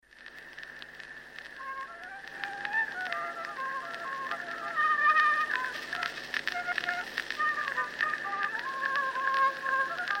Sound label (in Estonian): Polka; Kuusalu; folk